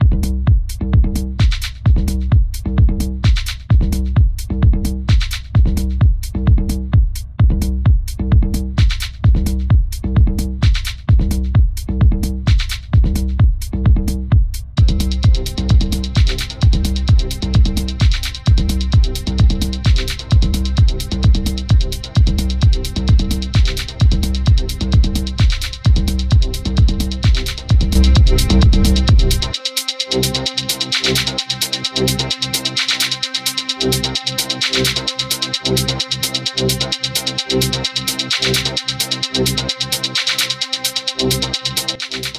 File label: Techno